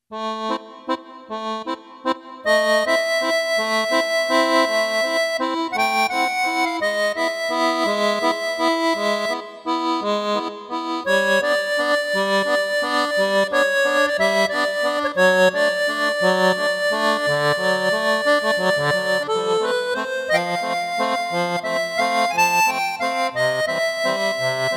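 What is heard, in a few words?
Small Accordion; Yamaha PSR-550; Voice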